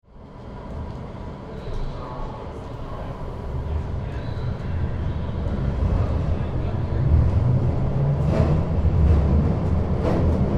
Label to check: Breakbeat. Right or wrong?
Wrong